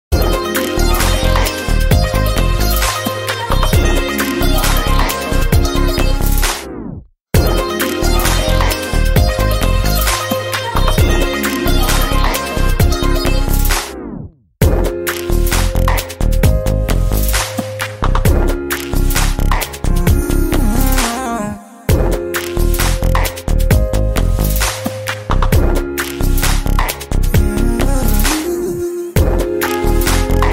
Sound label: bgm tamil, karaoke bgm ringtone